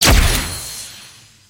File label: Foot Race Passthrough, foley